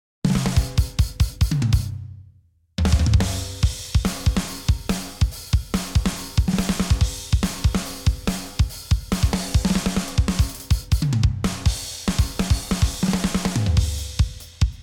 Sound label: Drums STEM; NCS オーディション楽曲